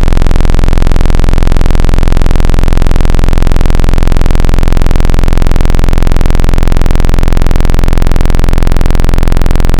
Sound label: Analog PWM Drift; Synthetic's Serum Collection; Echo Sound Works Core Tables